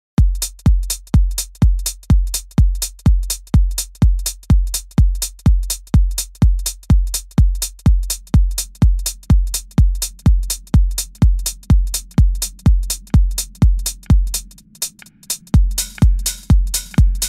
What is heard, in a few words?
flute groove piano saxophone snappy